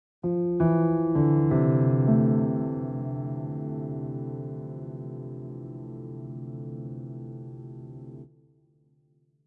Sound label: Winter Distraught